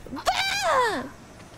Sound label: FUUU-